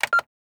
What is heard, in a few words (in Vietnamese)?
Tiếng bíp khi nhấn nút 1 tiếng